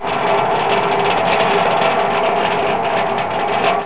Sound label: SAT JET